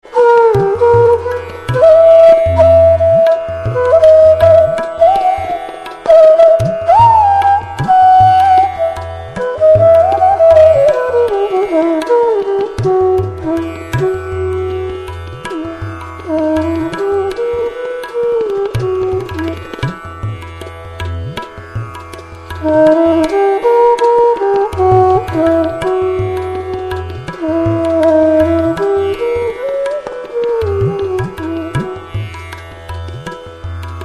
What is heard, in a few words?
Drut Gat